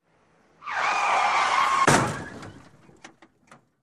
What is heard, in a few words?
Car Crash with Brakes Sound